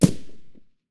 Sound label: m4a1 silencer mono far, weapons